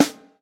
Original Snare Drum Sound C# Key, Royality free steel snare drum sample tuned to the C# note